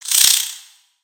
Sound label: Spider Exert; Lego; creatures mechanical